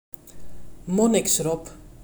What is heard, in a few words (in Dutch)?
Monniksrob, monachus monachus; mamiferos-marinhos